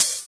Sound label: Open Hhat, HiHat; Equipped Music; Loungin House